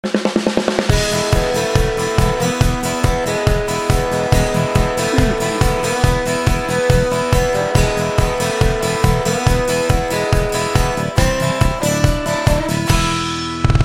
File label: positive outlook bed